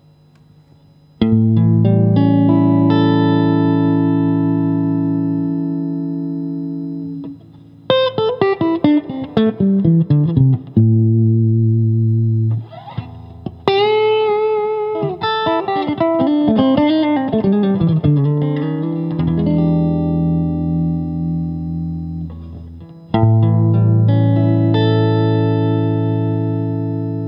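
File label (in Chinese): 60S OCC 单晶铜 清音 2档, acoustic guitar; kindman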